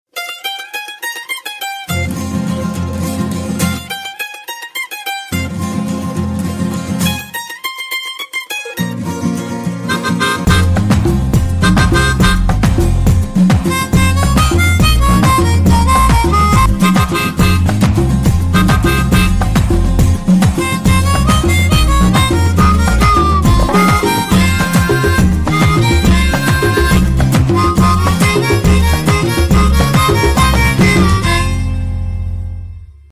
love bgm

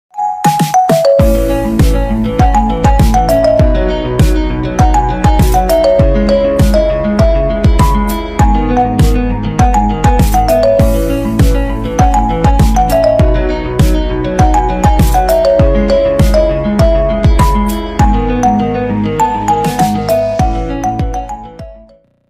ringtone marimba

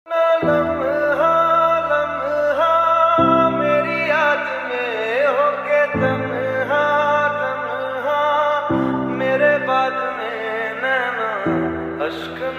lofi

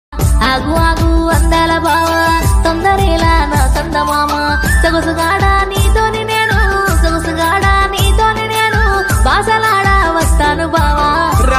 telugu folk songs telugu ringtone

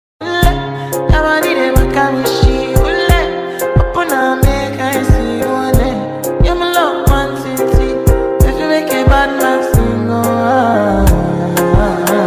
remix ringtone; mjmusic

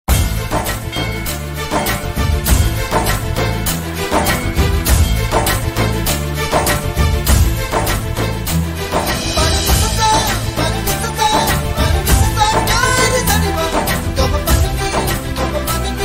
love bgm; santhosh subramaniam

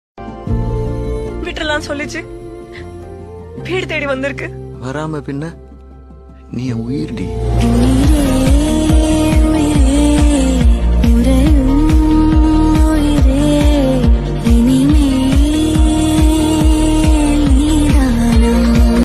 phone ringtone; amaran; tamil love

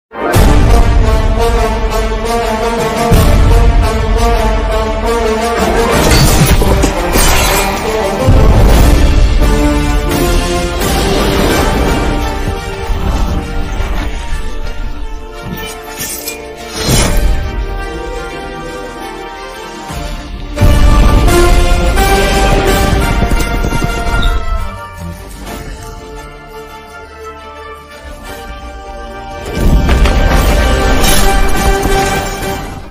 trailer bgm ringtone; ponniyin selvan

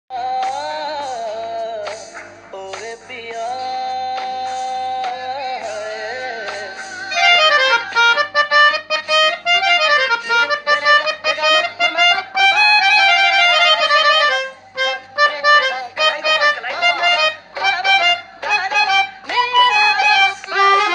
music melodica pianica

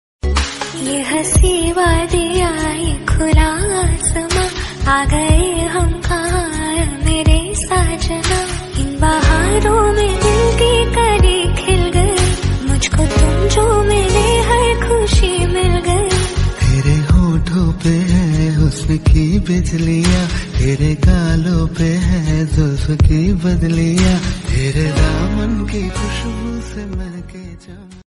flute ringtone instrumental ringtone; roja

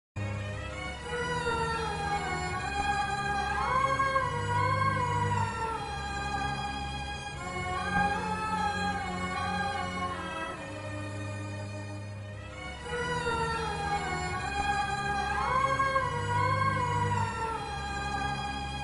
sad bgm; anniyan

